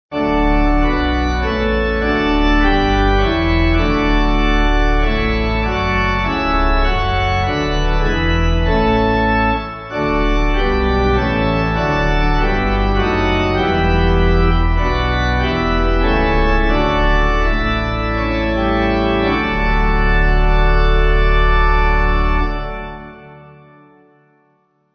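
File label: Pipe, hymn backing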